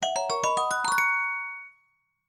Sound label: Fanfare First Rupee; TP